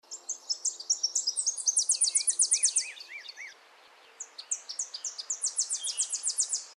WARBLER TENNESSEE, birds; species/birds; wbba

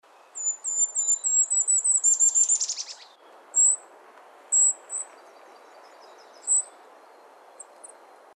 KINGLET GOLDEN CROWNED; species/birds; wbba

